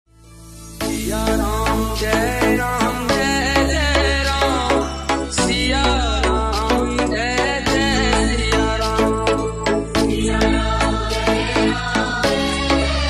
bass trap mix ringtone

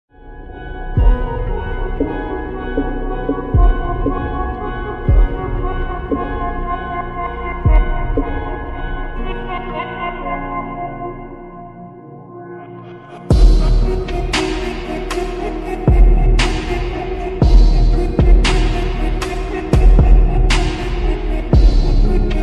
slowed reverb ringtone remix

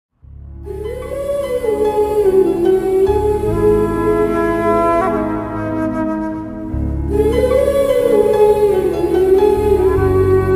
south movie background music ringtone; nuvvostanante nenoddantana

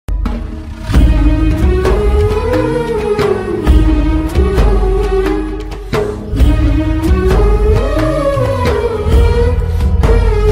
marathi bgm ringtone; baban movi

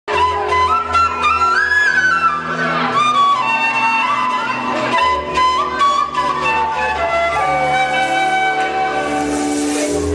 flute live; little krishna

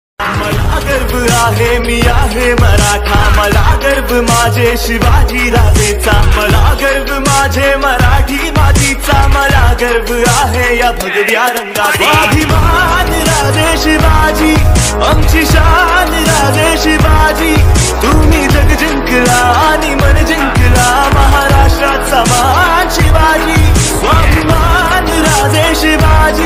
new music ringtone instrumental ringtone

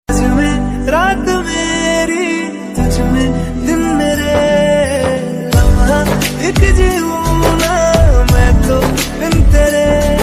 dosti ringtone

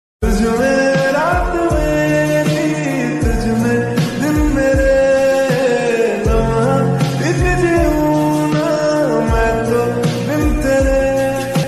remix ringtone hindi ringtone sad

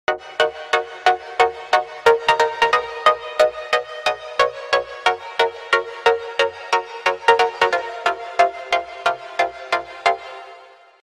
ringtone

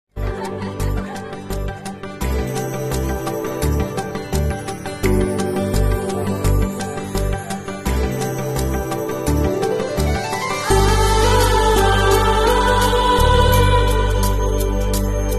odia love romantic bgm ringtone; tu mo love story 2; swaraj; bhoomika; odia bgm instrumental

